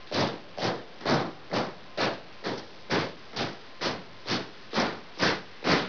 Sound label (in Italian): armi